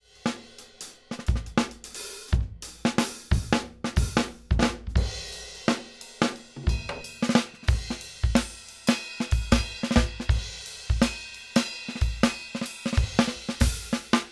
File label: drum oh eq out in out in; audio examples from the book